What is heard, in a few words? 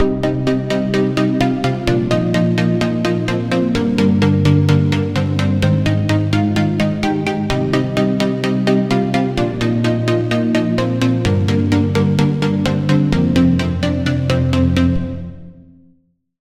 Chord; Routed Sound; Serum 2 Expansion - Star Scavenger Vol.1